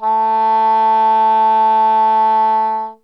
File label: rock type